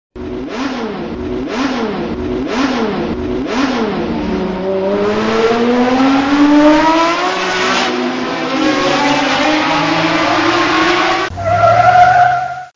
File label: run